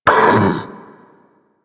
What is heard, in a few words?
Infested Splitter Vocal Pain; Warframe; Grey Strain